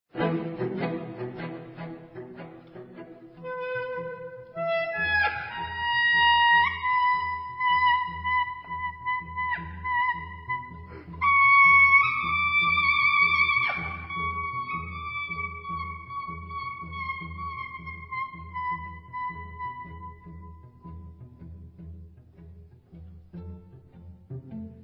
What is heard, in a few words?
Jewish Wedding, Chamber ensembles